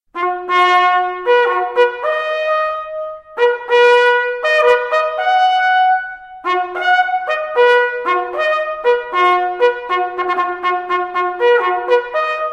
Gams tot

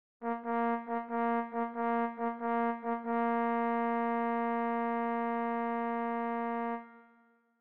Halali

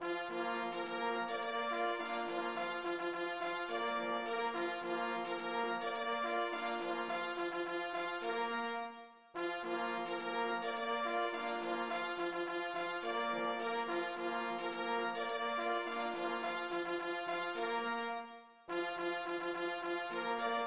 Hessischer Jaegermarsch

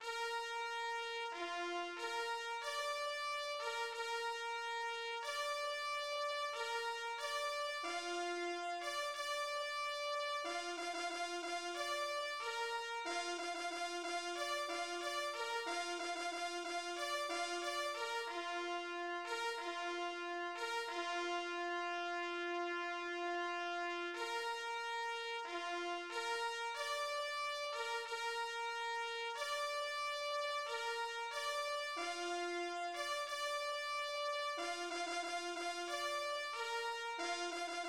Ehrenfanfare

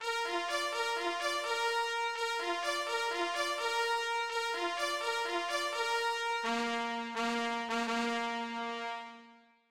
Anblasen des Treibens

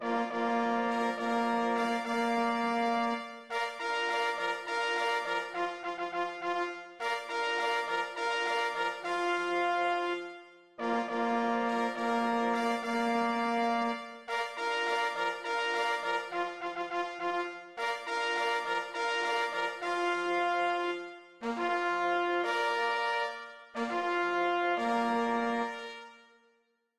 Damhirsch tot

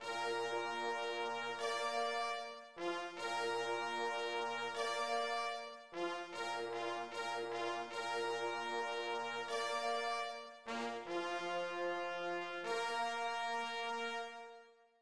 Jagd vorbei

